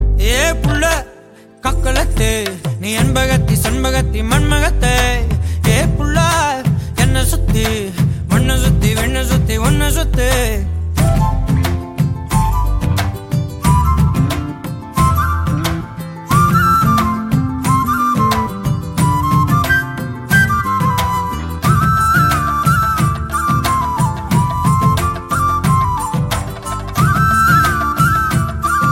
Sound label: Ringtone